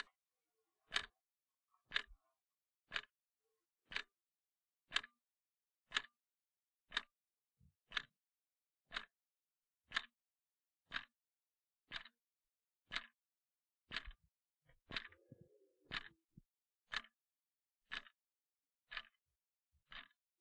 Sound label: Clock Ticking Loopable